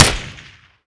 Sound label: skorpion, weapons; ModernWarfare